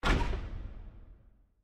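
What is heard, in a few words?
button auto stop click; Kungfu Showdown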